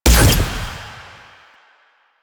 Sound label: Fire Shot; Duviri; Incarnon Adapters; Incarnon Dex Sybaris; Weapons